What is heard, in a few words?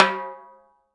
TIMBALE HI; Sonic Reality; Interactive Drum Kits